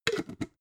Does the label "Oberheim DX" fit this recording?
No